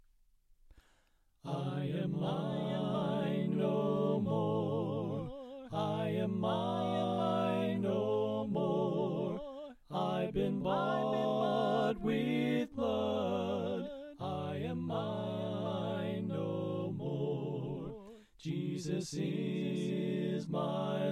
Soprano Left

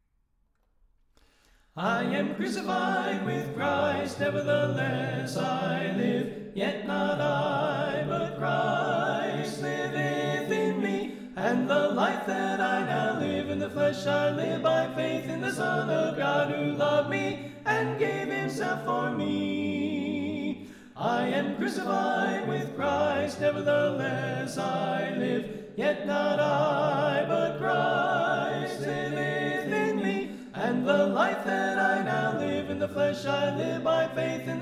Alto Left

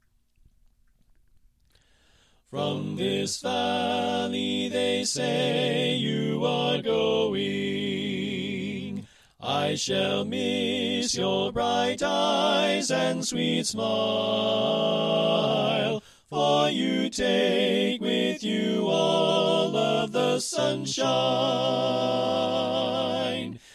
tenor; Red River Valley; Pennsylvania Groups; NBC

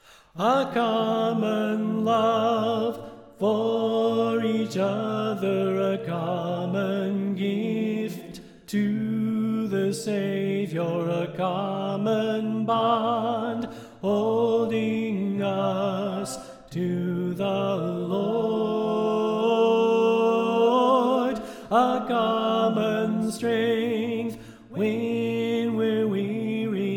Soprano Left

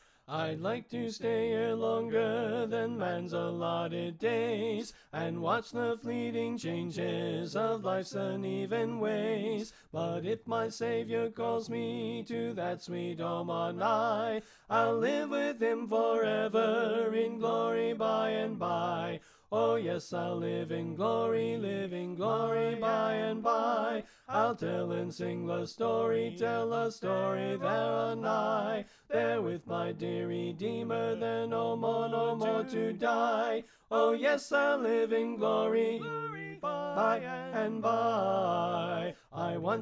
Tenor Left

